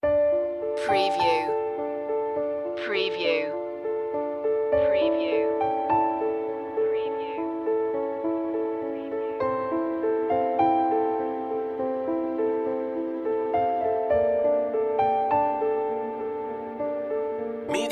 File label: autumn piano